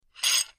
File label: Extending And Retracting A Steering Wheel Lock; The Club Lock